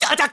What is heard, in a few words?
Hurt Fire; hurt by fire; Link; Skyward Sword; zelda